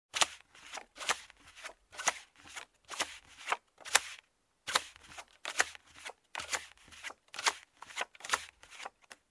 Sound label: Play Cards Dealing